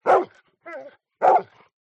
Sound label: animals dog bark med